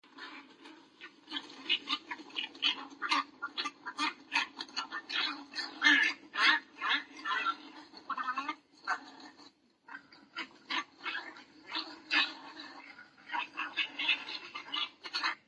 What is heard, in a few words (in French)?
Heron garde boeuf